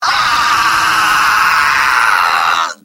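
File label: scout pain critical death; vo; tf2; mvm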